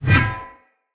Shield Whoosh; Weapons; Enemy; Shields; Sinecure Shield

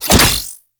Ball Catch Local; Warframe; Missions; Tenno Ball

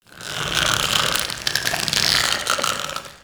Corpus Infested Tendon Stretch; Warframe; Ambience

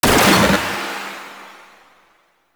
Detron Mech, Weapons; Warframe; Void Trader

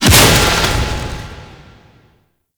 Shield Break; Vehicles; Abilities; Thano Mech; Warframe; Nechro Mech

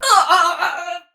Death; Warframe; Tau; Dax; Ninja

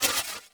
Bow Full Draw; Weapons; Warframe; TheNewWar; Omicrus

